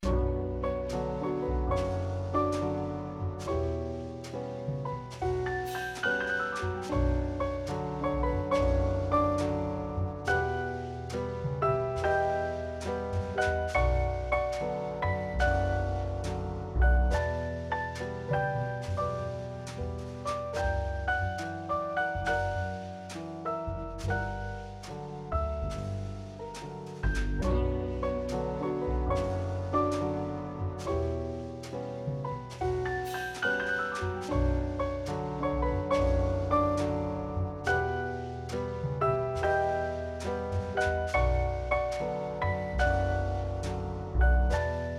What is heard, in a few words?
no vocals; htdemucs 6s